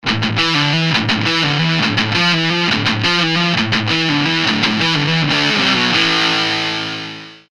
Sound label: marshall max g riff, guitar